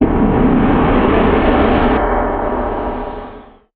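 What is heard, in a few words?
Deep Low End Noise Impact, stinger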